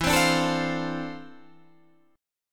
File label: D-Minor 7th, E; Dm7/E; strummed